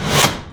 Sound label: Drawer Open Metal